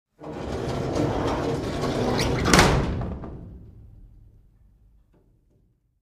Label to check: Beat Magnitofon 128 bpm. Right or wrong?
Wrong